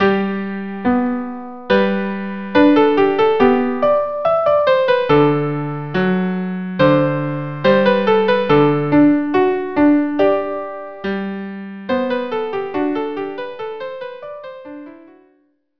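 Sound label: violin viola duo andante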